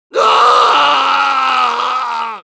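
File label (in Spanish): pain critical death, es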